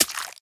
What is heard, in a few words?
Egg Pickup Spider; Devour; The Inn